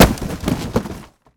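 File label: destroy cardboard large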